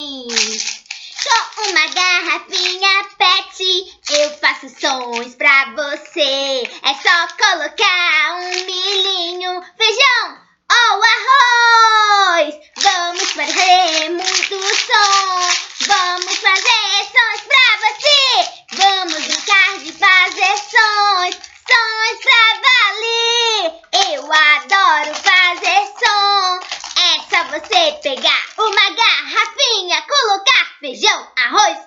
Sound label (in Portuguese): brincar de fazer sons vocal musica